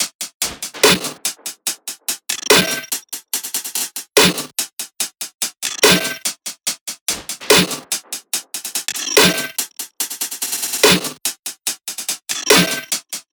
tops 144, Drum Sample Pack, Loops, Top Loops; SD SEISMIC; Slate

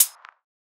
hat, drum sample; Hats

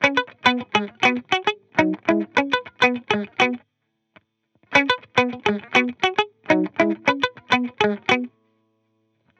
guitar loop 102 C#m; Pop; Guitar Stacks; SD PASADENA Pop Sample Pack; Slate

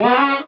vocal fly C#; SD AURORA Sample Pack; Slate

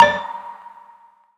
tonal oneshot D; Pop; Slate; PASADENA Pop Sample Pack; Tonal One Shots

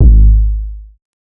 808 one shot Ab; Slate Digital BASSHOLE Sample Pack

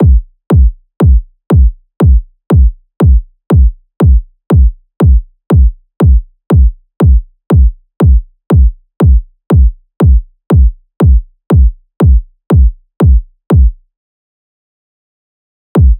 kick 120, Kick Loops; Drum Sample Pack; SD SEISMIC; Slate

